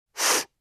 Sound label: Deep Inhale with Colds